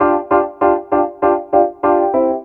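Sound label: keyboard, roots funk; USB Soundscan vol.41 - Roots Funk; AKAI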